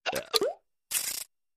Circus Machine L / R Ch. Cartoony, Robotic.; Cartoon Gadget FX

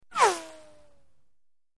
Tonal Bullet Whizzes, bullet bys

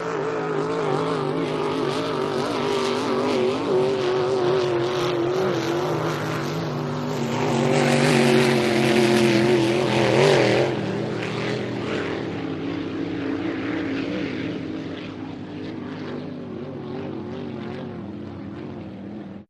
Boat Race Two Boats, boat race

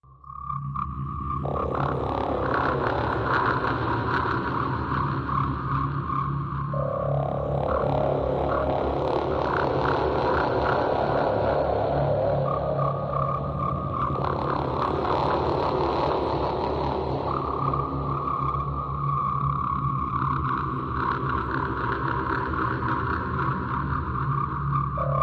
Twisted Energy Electro, energy beams

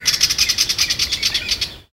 Critter

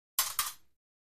Fencing Two Quick Sw

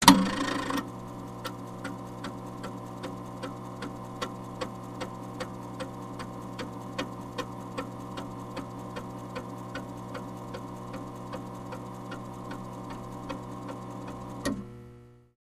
Computer Switch On; beeps & tones

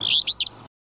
Flycatcher Chirp

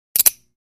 Free Weights Exerci

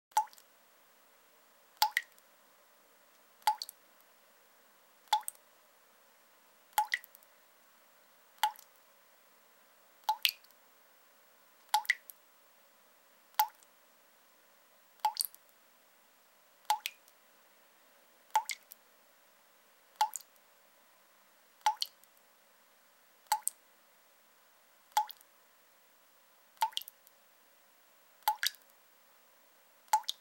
Drips Sink Or Tub

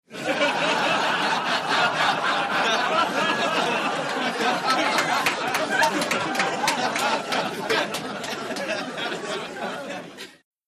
Crowd Light Laughter, medium

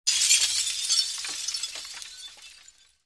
Glass Falling Steady; windows & mirrors

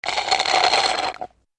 Wet Slurps Straw

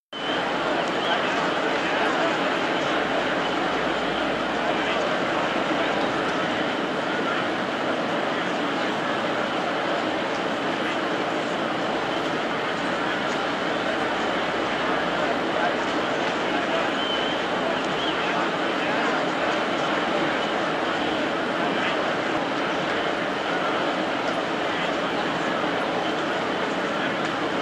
Football Crowd Busy, sports arena football

